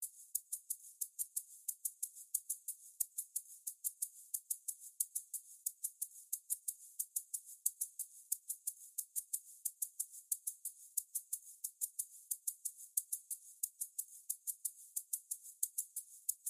Drums

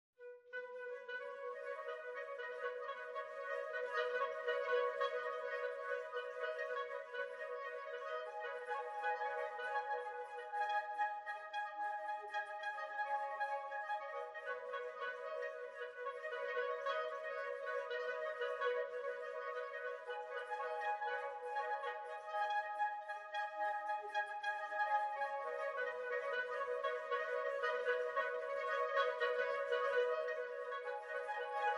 Flute Chatter Only

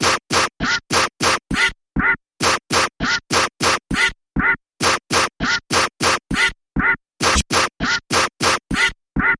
Mechanical Percussion Variation Short Version

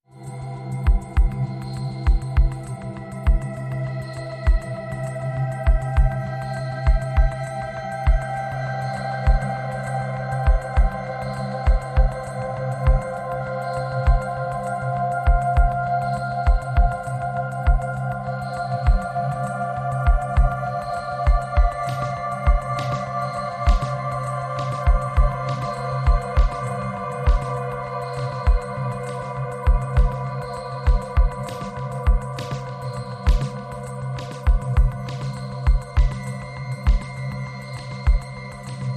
Beat

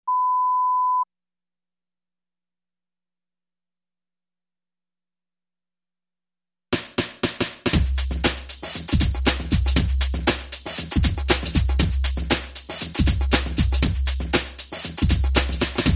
Drums

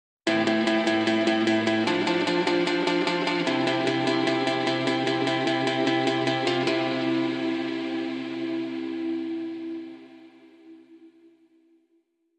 Sting

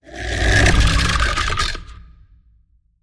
Goblin Danger Whoosh

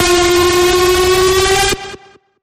Feel The Bend, F

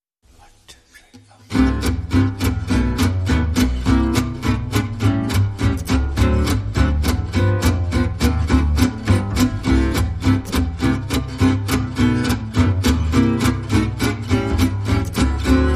Guitars and Bass

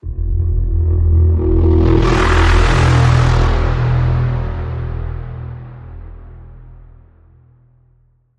Bend, E

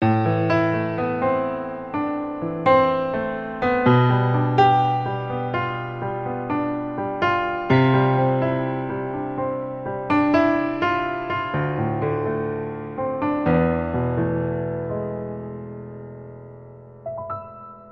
Introspective Piano Etude

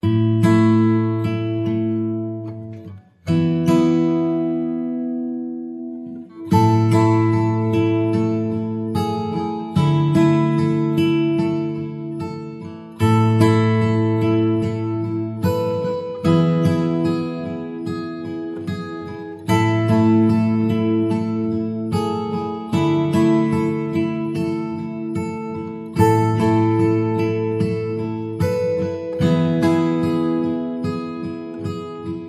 Bright Sunny Afternoon, No Drums

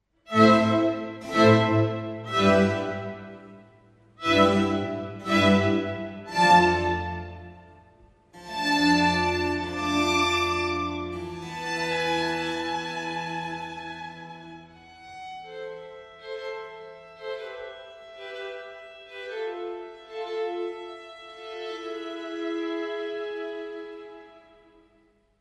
Concerto for violin in A minor; No 5; Antonio Vivaldi

